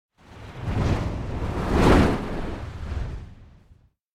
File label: Whoosh Air Rapid Movement Complex Whoosh; Hollywood Edge; sound designer's toolkit v2